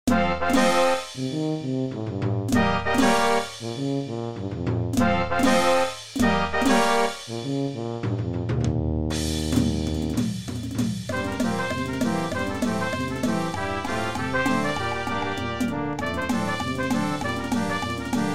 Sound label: jazzy rag; midi